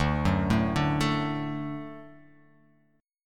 D Major D down, D Chord; D arpeggiated; JGuitar

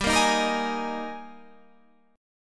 G sharp Major 7th Suspended 2nd

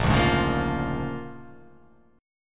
A sharp 7th Sharp 9th

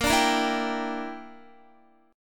A sharp 6th Flat 5th chord, Guitar; A#6b5 strummed; JGuitar

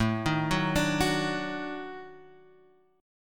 D sharp Minor Major 7th Flat 5th, Guitar

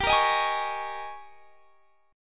Ab Major 7th Suspended 2nd Suspended 4th G

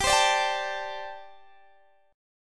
G Suspended 2nd Suspended 4th, Guitar DADGAD

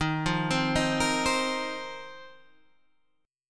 F 7th Suspended 4th D sharp

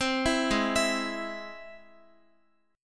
Ab Augmented Ab, Guitar, 4E, 4A, 5D, 5G, 5C, 6F; x,x,10,9,8,11; Ab+ arpeggiated

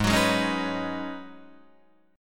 Ab Minor 11th, Ab, Guitar, DADGAD

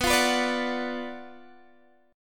Gb Major 7th Suspended 4th Sharp 5th

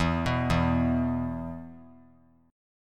E 5th; arpeggiated; JGuitar

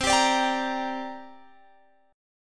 C 7th Suspended 2nd, Guitar, Open G

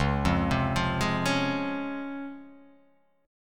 Db Major 7th Db down; arpeggiated; JGuitar

